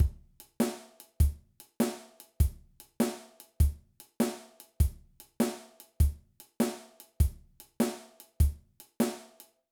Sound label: Shuffle Groove, drum